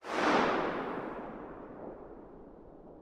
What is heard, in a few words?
echo building